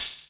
subtle open high hat sound a key

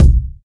short kick drum c key

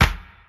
old school steel snare drum sample D key

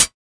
old school good closed hi hat sample g sharp key

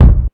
clean kick drum single hit c key

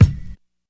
verby bass drum one shot c sharp key

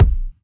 sharp bass drum single hit F key; kick drum samples

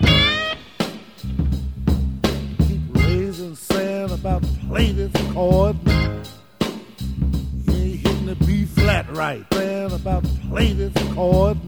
82 bpm hq drum loop g sharp key; drum loops

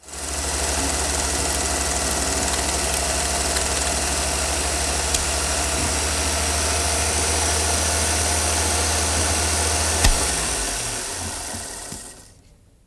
film projector 8mm stopping

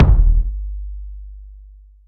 big room kick g key

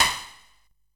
long snare drum b key; snare drum samples

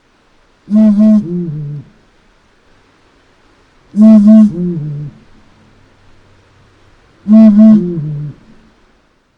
blakistons fish owl; soundscapes

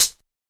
dark tone hihat sound e key

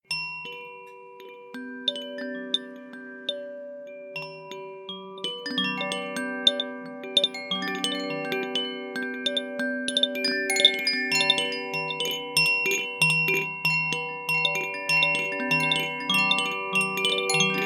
koshi wind chimes

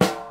studio snare sound a sharp key

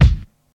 subby kick drum G key; kick drum samples

